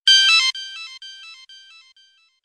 Nice Sms

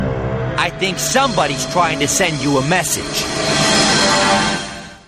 Somebody Sending Msg

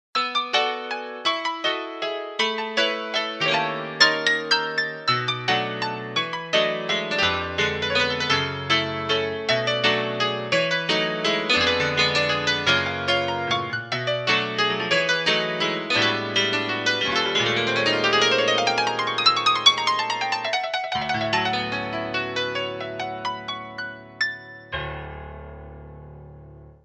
Nokia Waltz

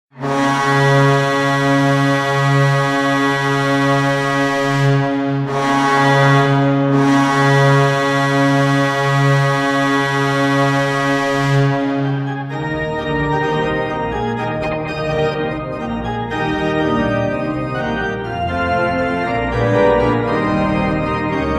Goal; St Louis Blues; meloboom

